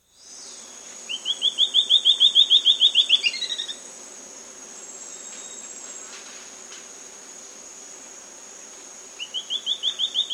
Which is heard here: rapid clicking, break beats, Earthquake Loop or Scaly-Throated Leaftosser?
Scaly-Throated Leaftosser